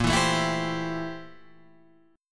A Minor 7th Bb, Am7/Bb chord

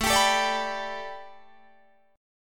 A 9th Suspended 4th A, Guitar DADGAD; chord

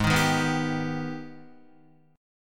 Ab Minor Major 7th, down, Guitar; strummed; AbmM7

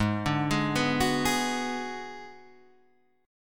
Ab Minor 7th Flat 5th, Guitar, Half Step Down

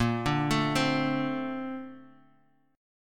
A sharp Major 9th, Guitar, Open F; arpeggiated; JGuitar

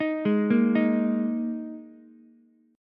Bb 7th Suspended 4th Ukulele, chord; arpeggiated; JGuitar

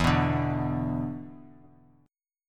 B-Minor Double Flat 5th E

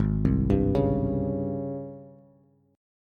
G sharp Major 11th, Bass; arpeggiated; chord; 4,3,5,6

